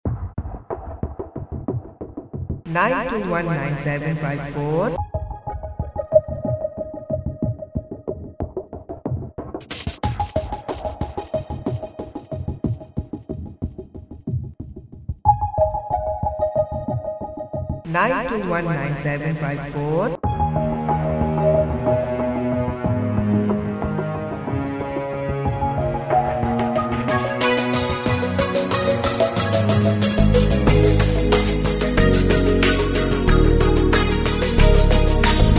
Dream Remix; Airtel

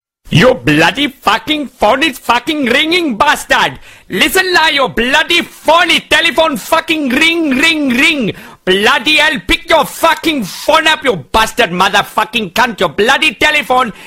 funny hindi ring

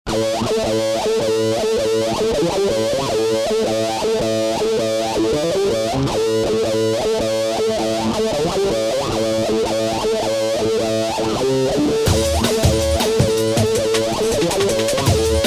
Crazy guitar; Funny instrument

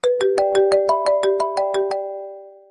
iPhone SMS Ringtone

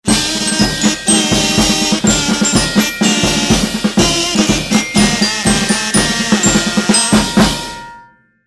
Kazoo Marching Band

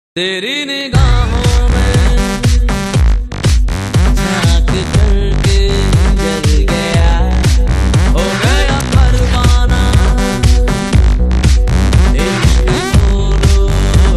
Hindi Remix Tones; Hindi Movie

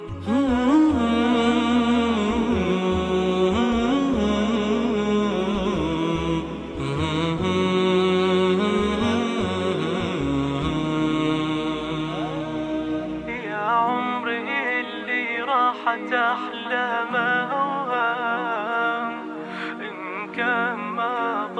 Arabic Islamic Ringtones

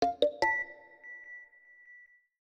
notifications; OnePlus 6 Ringtones

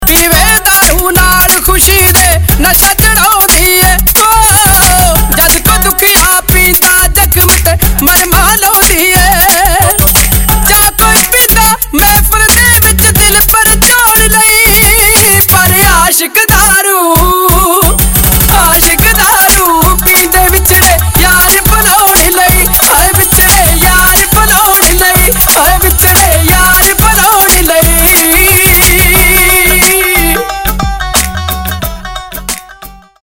Sad Ringtones, Punjabi

